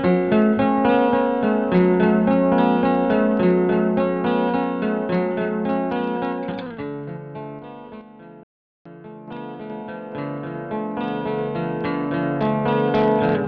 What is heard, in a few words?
stereo pan; Digital Audio FX